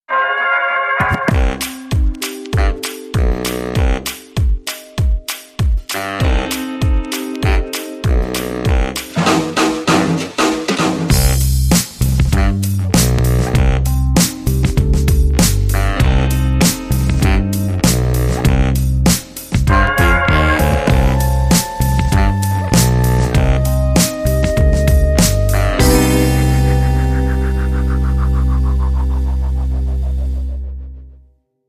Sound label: Bariton, Rhythm